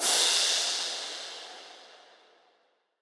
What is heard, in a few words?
Sandpaper Crash; trap; Cymbals; Cymatics; Trap Starter Pack